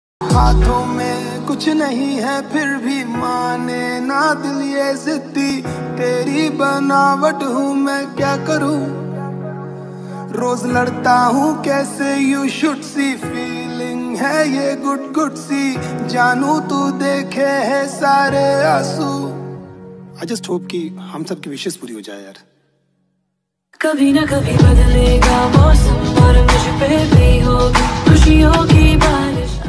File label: hindi rap ringtone